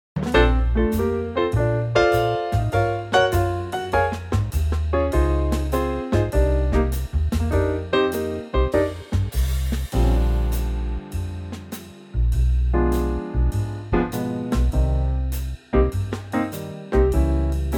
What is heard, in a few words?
Bb trio; key - Bb - vocal range - Eb to G